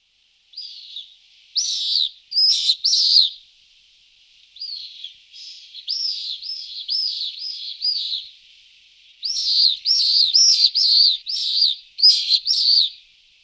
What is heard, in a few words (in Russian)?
a.pallidus, golosa apodiformes